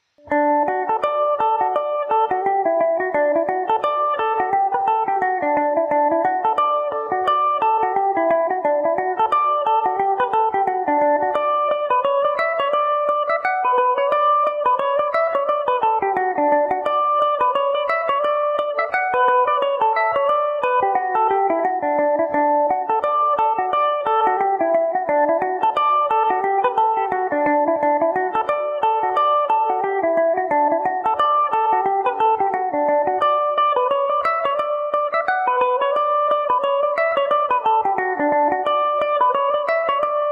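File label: reel D major, Irish mandolin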